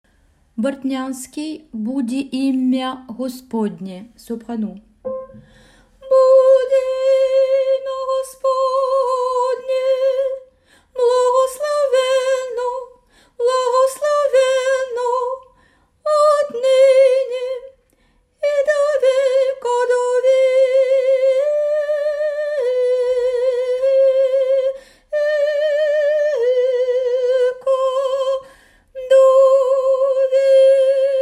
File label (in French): soprani